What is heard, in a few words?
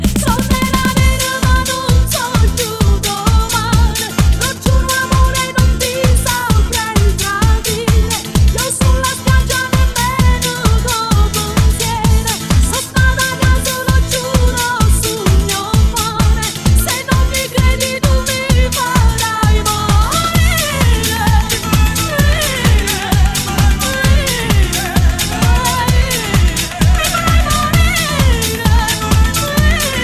remix